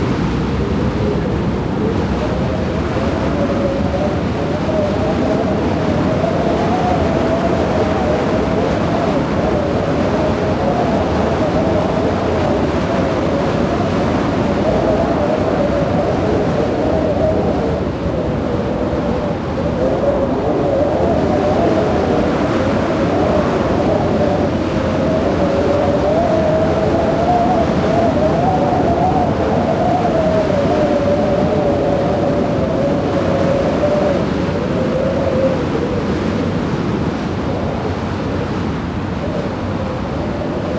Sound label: Skyscraper Rooftop Stormy, noise; Max Payne; XBOX